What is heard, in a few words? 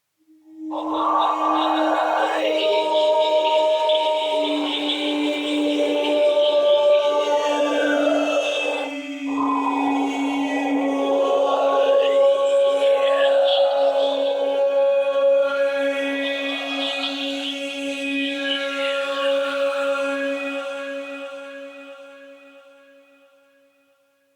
Choir; Warframe; Sentients